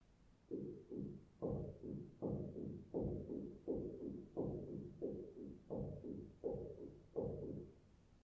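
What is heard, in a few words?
surippa, slippers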